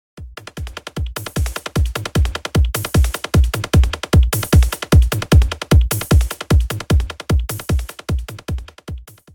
house beat

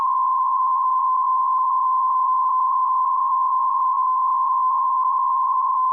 Unknown 26.882 5 kHz

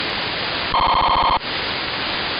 SDRSharp; 16bit 96k; OOK-Signal (FS20) aufgezeichnet

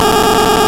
sdr 30k; 433MHz

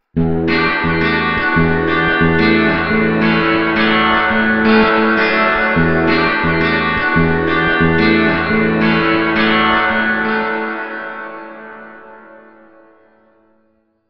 guitar

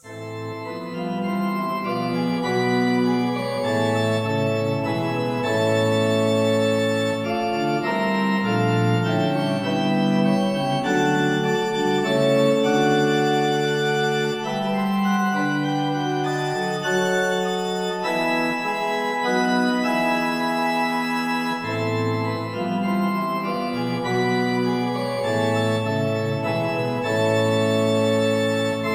Modulations, Farfisa Caroline; Orgel

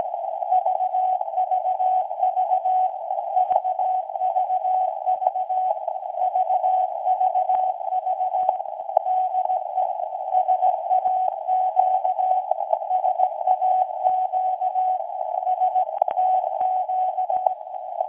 websdr SAQ BW 0.26kHz

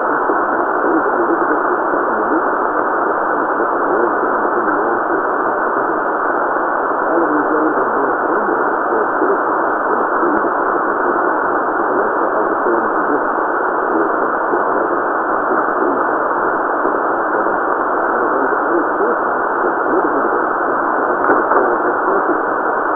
BBC BIG BEN, 162.0kHz; websdr; RTL auf 234 kHz

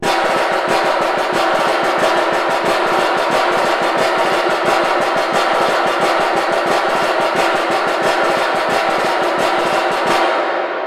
snare

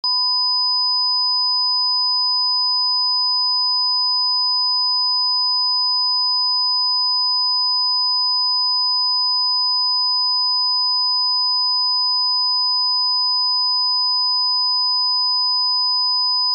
5khz 1khz; Wavedatei mit zwei Spuren als Stereo, erstellt mit Audacity V 2.0.1

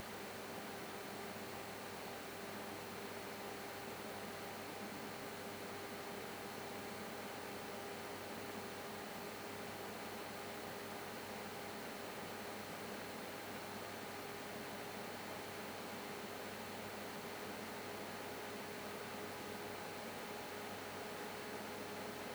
Gelid Silent 5

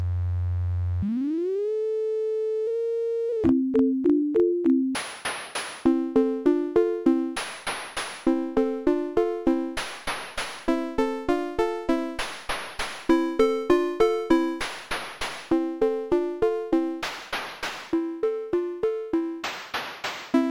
FPGA Synth Melody With Noise

